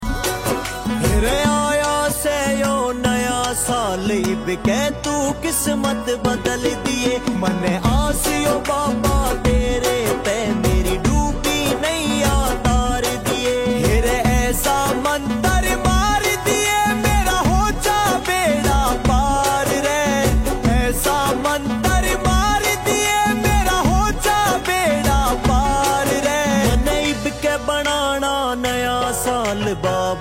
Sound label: Naya Saal Song Bhakti New Year, ringtone